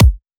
drum hit finish; osu!

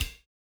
Two Step A Hit Ride; osu!

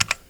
key movement; osu!; skins! PRE RELEASE

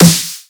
soft hitclap; osu!

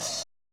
DnB Breakbeat Hit; osu!

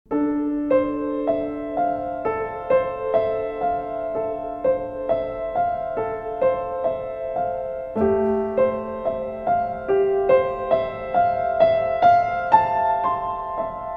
Piano Works

